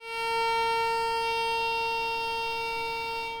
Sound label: A sharp 4 reverb no vib